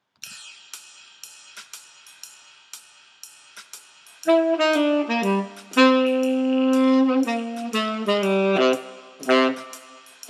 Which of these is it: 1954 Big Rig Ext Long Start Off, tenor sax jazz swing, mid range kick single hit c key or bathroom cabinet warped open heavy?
tenor sax jazz swing